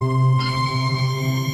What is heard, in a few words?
tone; intaleq